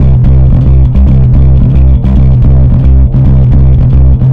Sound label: Bass, Distorted C; Bass X